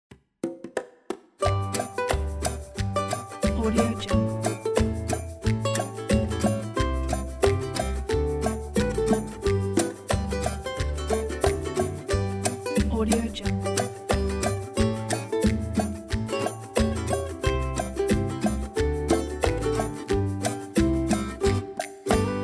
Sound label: happy snappy ukulele; applehillstudios